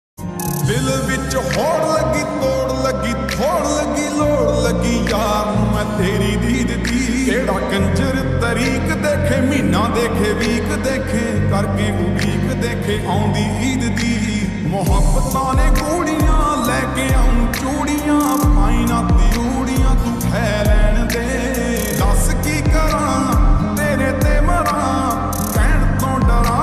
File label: lofi punjabi ringtone